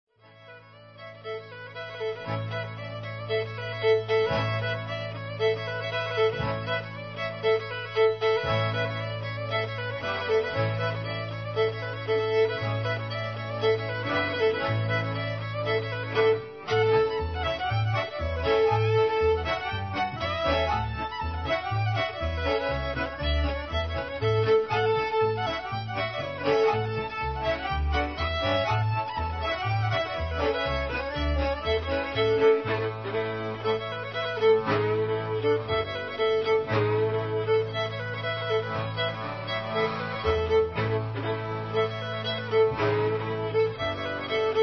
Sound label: jig; ceilidh